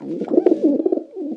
pigeon idle, creatures; Counter Strike Model Pack; CS:GO

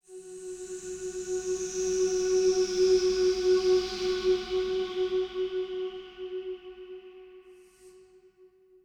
ambient beautiful destruction, synth; Portal 2

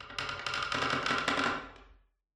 ambient wood board creak

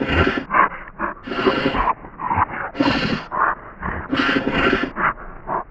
breathe loop, antlion guard; npc; Half Life 2; Episode 1

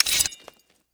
knife bowie draw; weapons; Counter Strike Model Pack; CS:GO

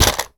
weapon rifle impact; physics; weapons

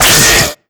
pain, headcrab black; creatures; vox

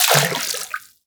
fs fm water, footsteps; player; Portal 2

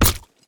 healthshot thud

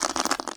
scurry; headcrab classic, movement; creatures

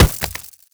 body impact, headcrab black movement

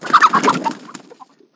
chicken panic; ambient; creatures

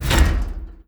tick, carousel; hinges drawers

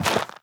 step light, footsteps, gravel; player

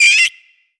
pain, headcrab, creatures; headcrab classic; vox

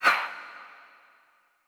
ghost swish, halloween

